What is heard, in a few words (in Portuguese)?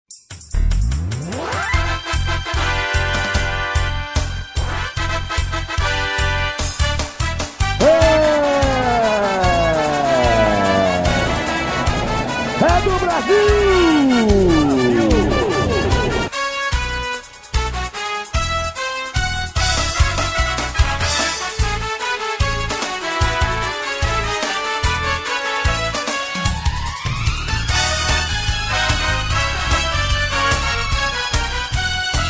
Copa Do Mundo vinheta